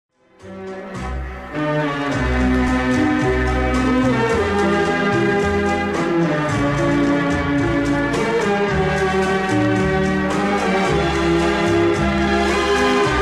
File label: orchestra